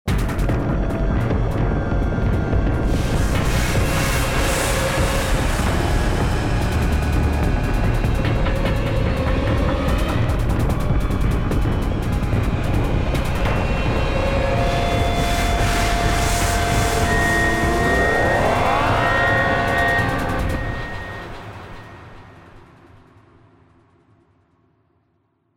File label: Descent; inversion